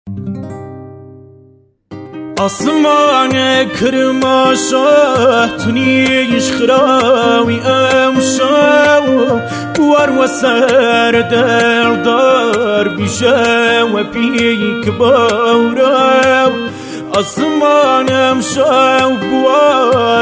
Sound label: kordi live voice